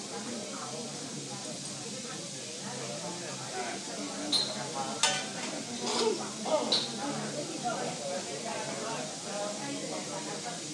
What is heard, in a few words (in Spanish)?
olla a presion industrial preparando escudella i carn d olla